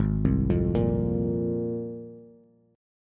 G sharp 6th Add 9th, Bass; 4,3,3,3; down; chord shape

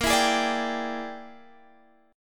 E 7th Sharp 9th Flat 5th A sharp, guitar

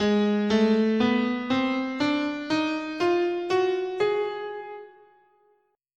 Ab Diminished Half Whole, scale

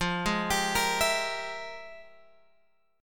F Minor Major 11th; {13 11 X 12 11 12} arpeggiated

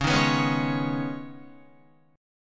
Db 7th Suspended 2nd Db, down, Guitar; Db7sus2 strummed; tuning=4E,4A,5D,5G,5C,6F

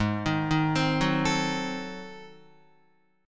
G sharp 7th Flat 9th G sharp down Guitar, chord; G#7b9; arpeggiated; JGuitar

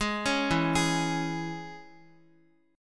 E 6th E, Guitar; arpeggiated

